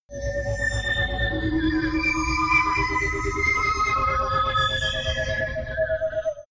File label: sci-fi creeping loneliness, horror